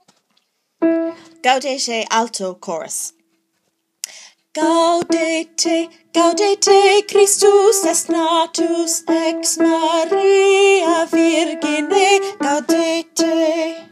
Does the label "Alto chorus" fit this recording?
Yes